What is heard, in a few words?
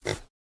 ambient, pig, baby pig; minecraft 26.1-snapshot-2; mob